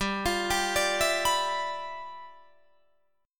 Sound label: G 13th G Guitar Drop D, chord